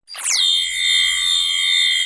romulan disruptor

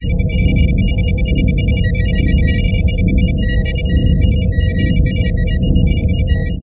ambient bridge, computer

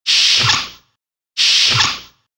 swoosh; TOS

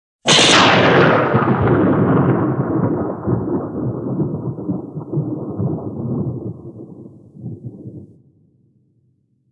thunder; tos; apollo

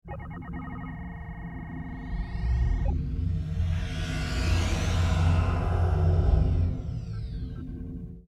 transporter

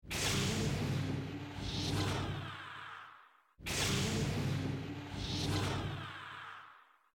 doors; TNG

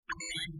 ship beeps; alien sounds; andorean

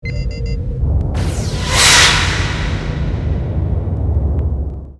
probe launch

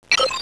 computer beep

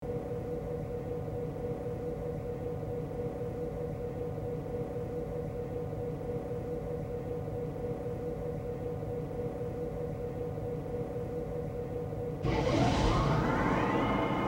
turbolift move start clean

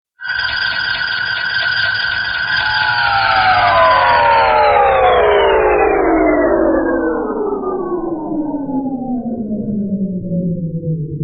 bridge loss power; TOS

